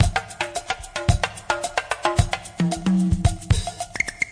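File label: 111 bpm, jamming groove, drum loops and breaks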